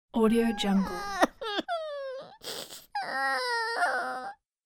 small kid short cry

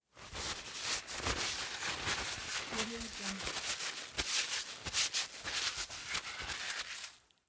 wiping hands with a tissue; پاک کردن دست ها; human sounds

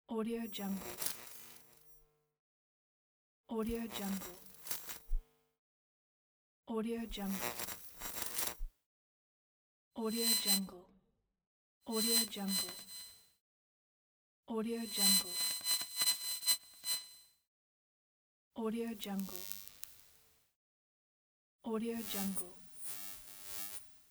electromagnetic movements

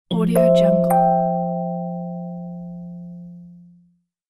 kalimba message notification; interface sounds

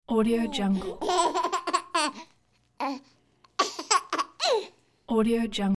laughing baby; human sounds; خنده ی بچه

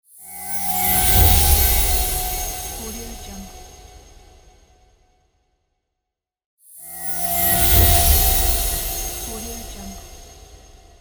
divine spell; game sounds; طلسم جادویی

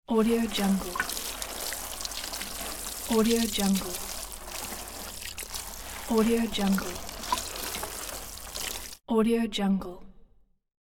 watering plants with plastic can

